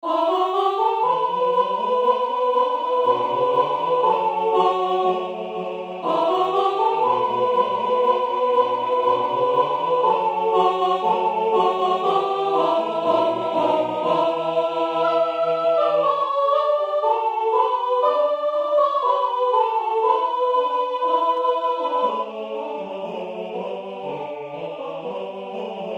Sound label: Passereau sop